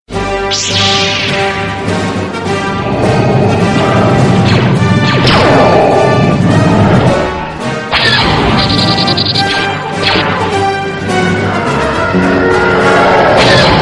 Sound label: ringtone; star wars